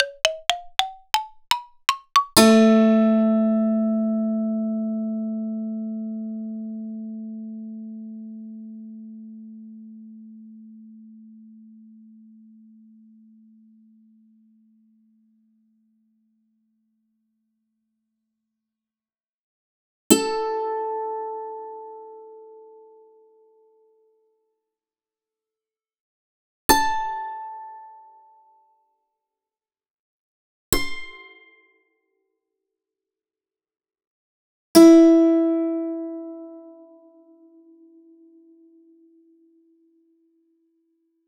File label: Tuned Percussion; Sliced